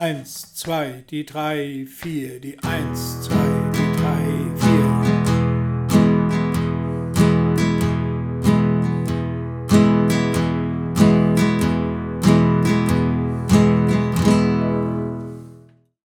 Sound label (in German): Triolenswing